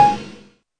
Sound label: klonk